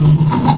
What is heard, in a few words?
open